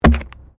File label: PLASTIC RUBBER PIPE